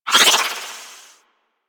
Arch Wing Prime Dodge Roll Right; Warframe; Vehicles

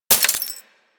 Bullet Impact Debris Glass; Warframe; Weapons

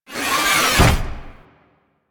Animal Foundry Pillar Lower; Tenno; Warframe; Liset; Hub

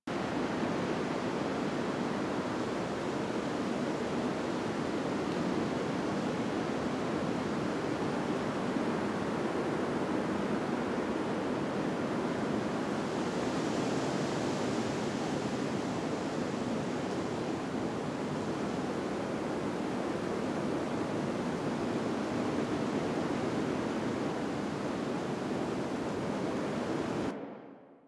Forest Exterior Crevice Air Amb; Warframe; Ambience; Grineer Forest

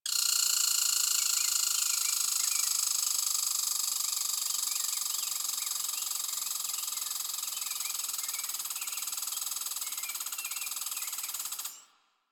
Tree Squirrel; Warframe; Ambience; Nineteen Ninety Nine

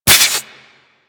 Inflate; Peculiar Inflation Mod

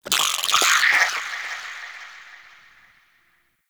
Vocal Pain, Shrimp Lantern, Creatures; Baliq

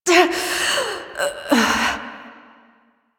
Fight Breath; Cinematics; Warframe; The New War; Throne Room

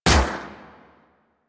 Shot, Akimbo Pistol; Grineer; Prisma Twin Gremlins

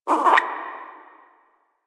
Exterior Forest Critter

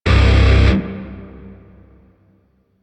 Shawzin Pent Min Chord A; Grineer; DE; Warframe

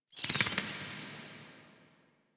Interior Cave Bat; Warframe; Ambience; Duviri

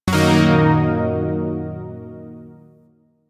Yo Chord C, Zariman Shawzin; Music; Warframe

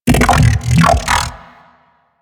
Ambient Vocal; Nechro Mech

